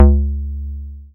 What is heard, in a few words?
Bass SH-101